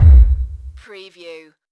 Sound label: Distant Giant or Dinosaur footstep (mono)